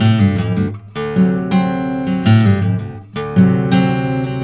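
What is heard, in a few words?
baritone riff, Blues Guitar; ILIO; Fretworks; 108 BARI RIFF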